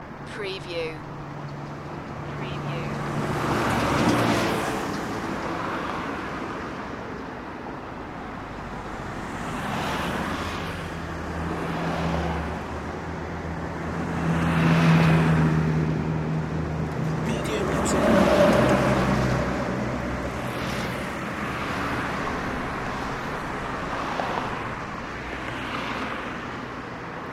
heavy truck traffic loop

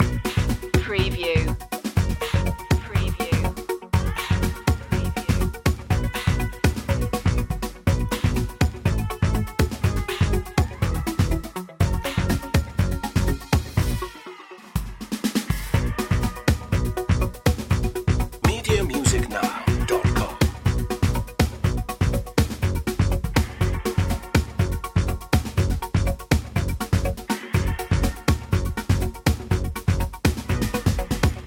bubbly loop

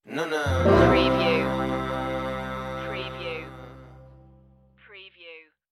happy western stinger

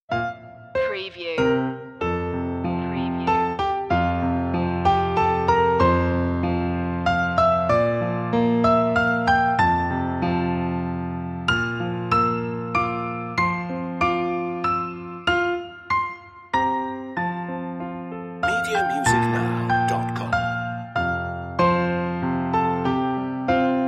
piano; the first noel; media music now

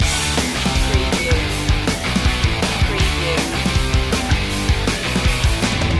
rowdy attitude loop

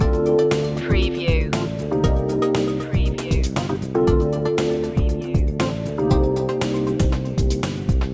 flute and fruit loop

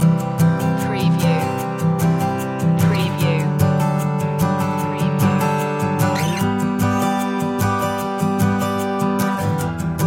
loop

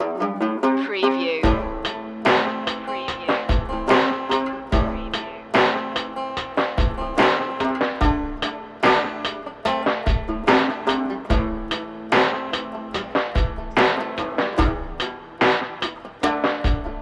banjo beat

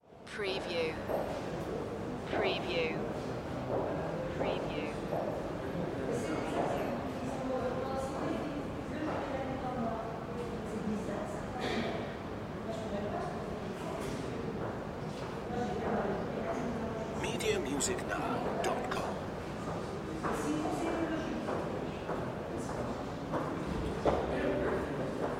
France Museum Crowd

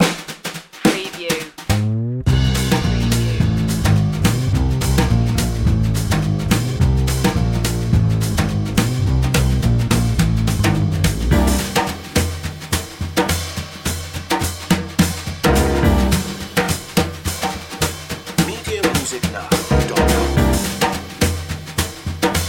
magic whistle

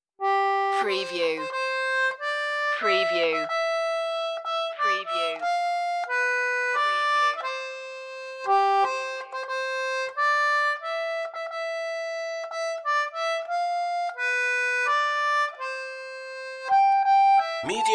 solo concertina; o xmas tree tannenbaum

